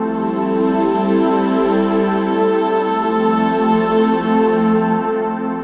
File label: String Loop